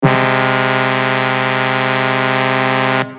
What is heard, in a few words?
truck horn